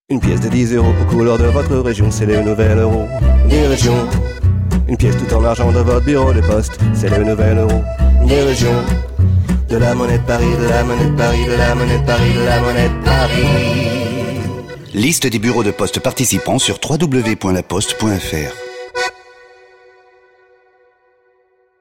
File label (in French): voix et chant; MONNAIE PARIS